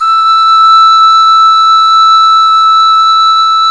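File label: pan flute E5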